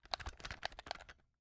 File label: Digital Joystick Button Pushing And Stick Moving; Multimedia Internet CD-Rom Flash